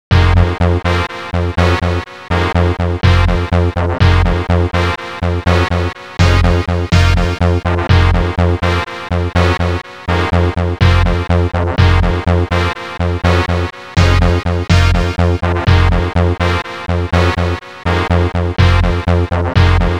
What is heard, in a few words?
Incoming Message; Judge Dredd; SNES; Type B